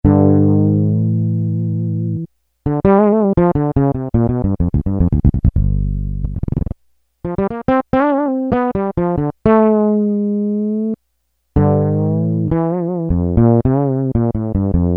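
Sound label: Fretless Bass 1, Roland W-30; Factory Performance Patches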